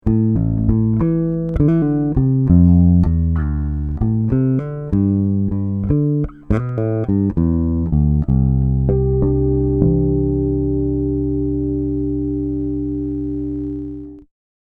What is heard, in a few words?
junior finger, bass; fleabass